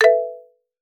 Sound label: app alert tone